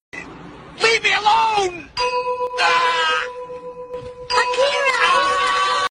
ahhhh

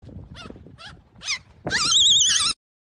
distorted seagull squawking

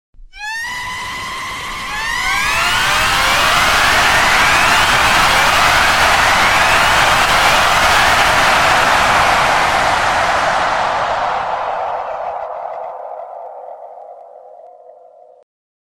chicken on tree crazy

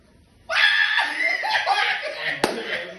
laugh; wanda

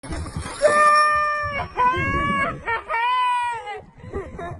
laugh

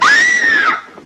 scream; jerry

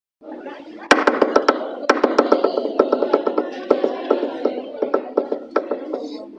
motor powering

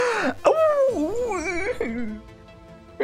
ouhhhh

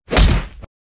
thud sound

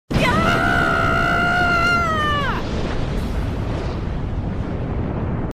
explosive yell; rosalinas

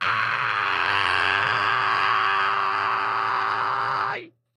ayyyyyyyyyy

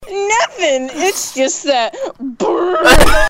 burrr

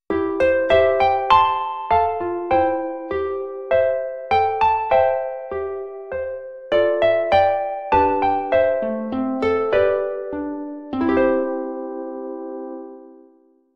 chime mellow

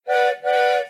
whistle

